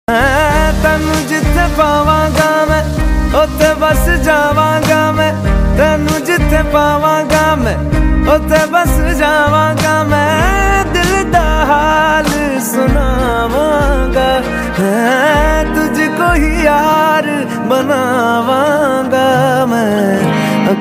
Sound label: Romantic Hindi Song Ringtone